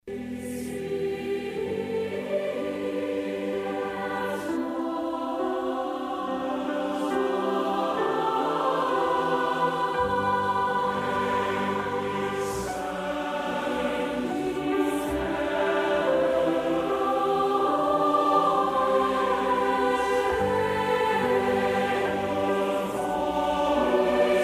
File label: Virtual choir